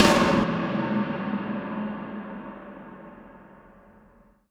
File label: Snare Shard